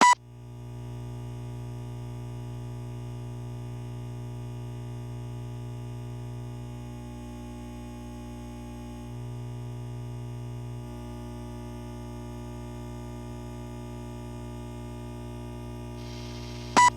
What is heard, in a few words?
game start with intro